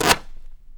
RIP, Canvas Bag Rips

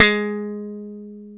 G#2 SLAP, SLAP BASS; AKAI S-Series CD-ROM Sound Library VOL-7; SLAP BASS 1

